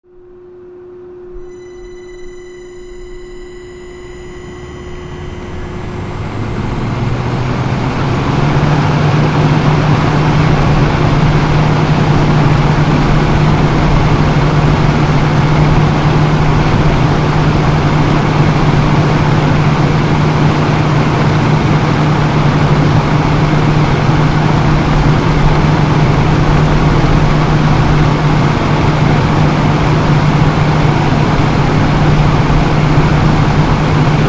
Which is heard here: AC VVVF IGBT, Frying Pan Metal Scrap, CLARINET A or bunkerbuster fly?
AC VVVF IGBT